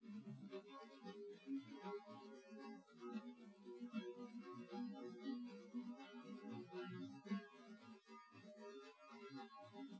nodrums inst sim

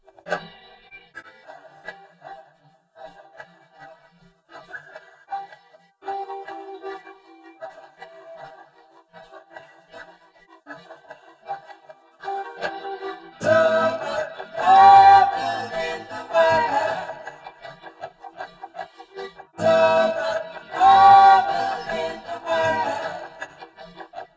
voice

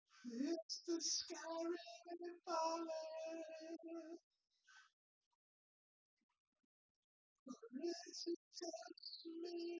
nodrums synthconv; SiSEC08; SiSEC underdetermined

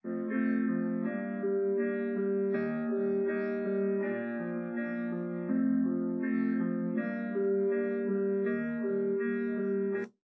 drums; SiSEC10; underdetermined

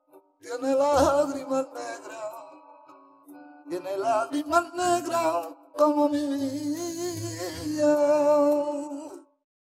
Voice; ICASSP11

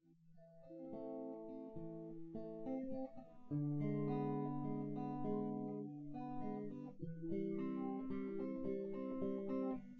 nodrums synthconv 250ms 1m; SiSEC08; SiSEC underdetermined

